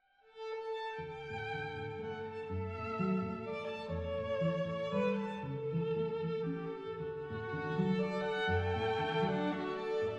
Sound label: Piano Quintet; Gernsheim